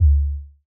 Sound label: Kick, Trap Foundations Kicks